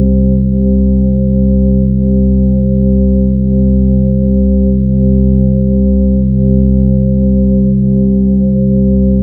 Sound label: C3, SynthLine; 90 s Sample CDs; NiceBeats; SynthLine VOL-22